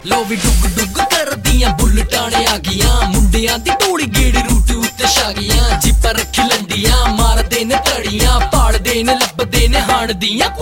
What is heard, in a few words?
Punjabi